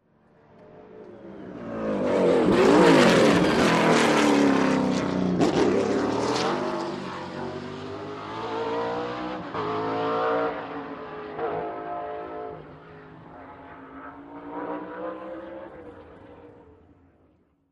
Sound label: Sports Car By Turn